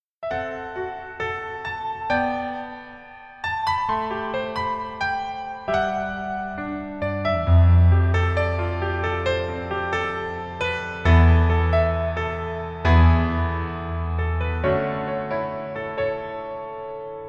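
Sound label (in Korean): Piano version 멜로디MR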